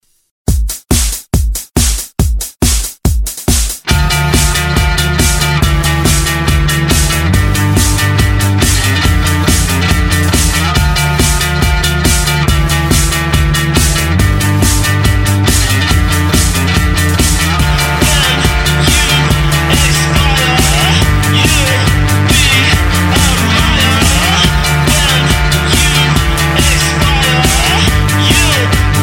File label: Dance